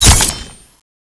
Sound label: wind knife stab